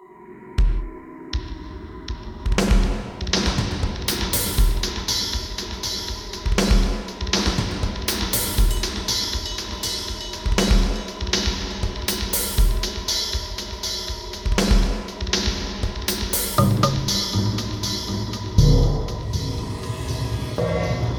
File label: Cave loop